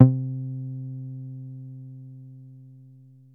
303-Style single hits

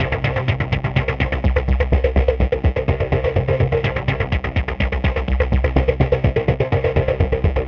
synth 125 riff C; Transmission-X

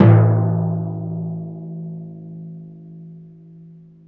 timpani A1; E-MU Formula 4000 Series Vol. 5; Protozoa; Proteus2 Presets

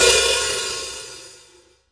HH OP, Drum Dry; East Collexion; Drum 1

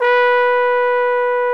BRS FF BONE, Solo T.Bone; Roland L-CD702

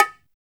BGO RIM, BONGOS; Drums In Your FACE!

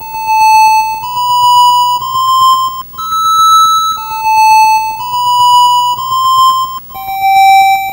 Atari 2600 Synth FX; 8-Bit; Chiptune

